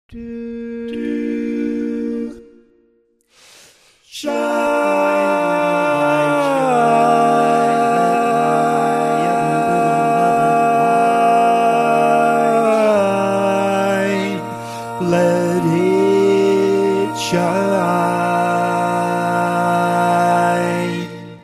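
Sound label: Baritone, barbershop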